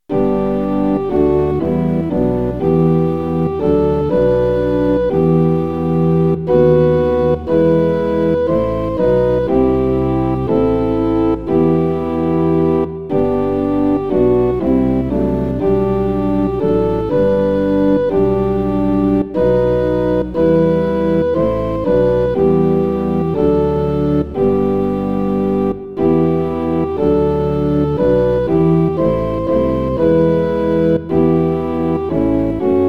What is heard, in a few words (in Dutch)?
Orgel; Heer ik hef mijn hart en handen